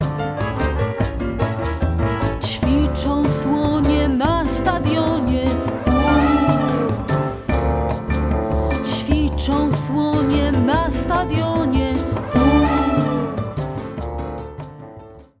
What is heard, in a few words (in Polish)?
wokal